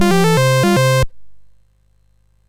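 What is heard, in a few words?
Number Tumbler, MOS 6581 SID chip music, games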